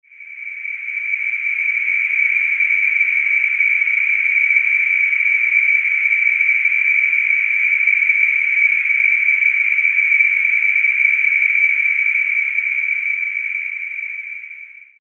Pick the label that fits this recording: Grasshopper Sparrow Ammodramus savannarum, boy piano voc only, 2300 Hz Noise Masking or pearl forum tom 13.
2300 Hz Noise Masking